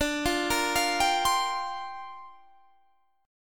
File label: Bb 6th Add 9th D